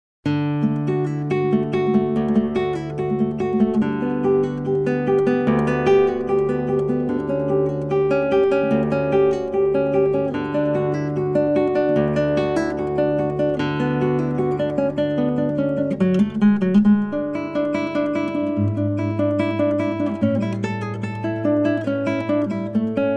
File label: Prelude from Cello Suite, Guitar; J.S.Bach